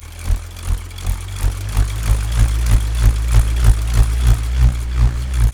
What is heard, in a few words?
BULLROARER, Primitive Instruments